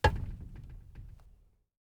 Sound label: glass land